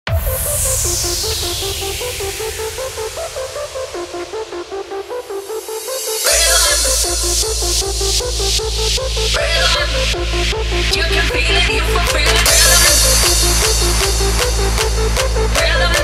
hardstyle remix

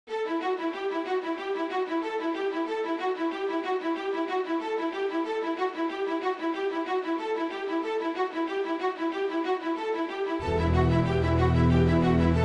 angry aggressive rap beat

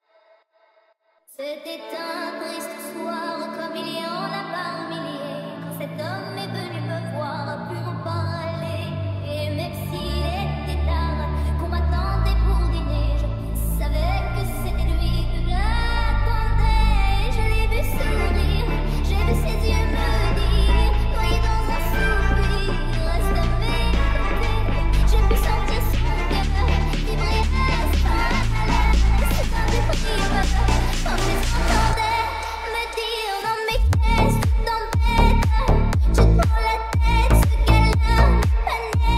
remix, car music

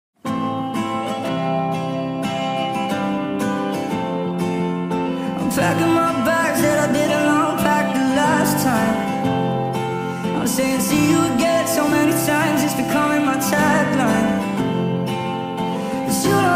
speed up reverb; audio edit tiktok version

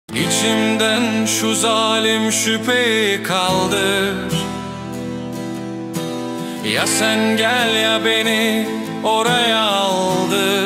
psychedelic Anatolian rock

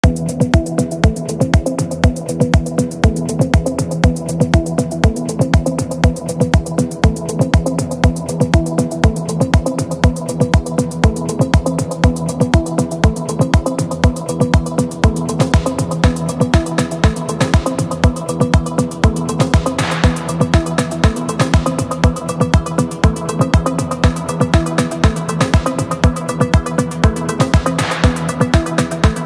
afro house

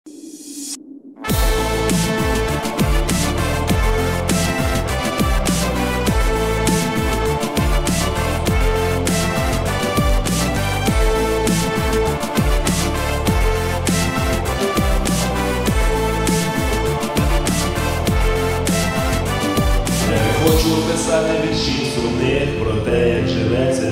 beautiful instrumental music

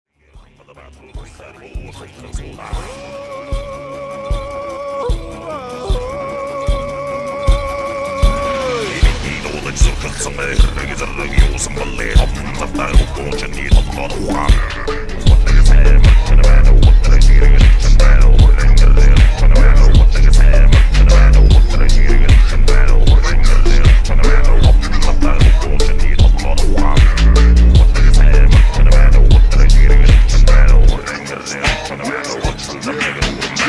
Mongolian slowed reverb; mongolian version

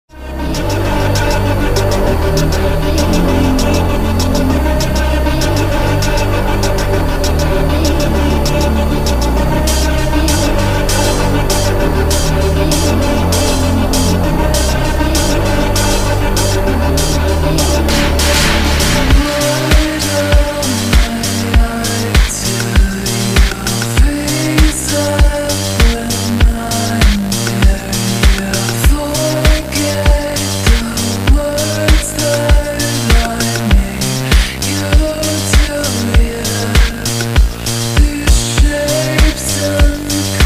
looped slowed

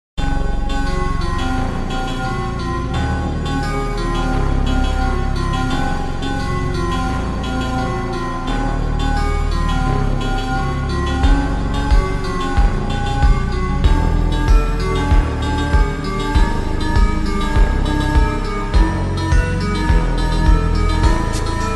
super slowed reverb

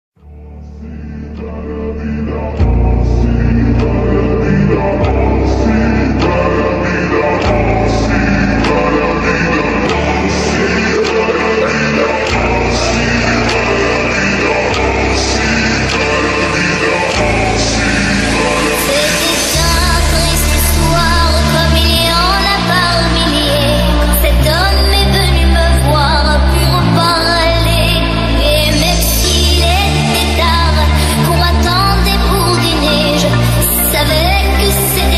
remix slowed and reverb

